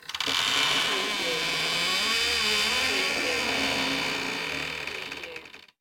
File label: Heavy Door Creak